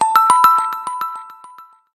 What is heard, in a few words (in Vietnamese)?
Nhạc chuông tin nhắn Oppo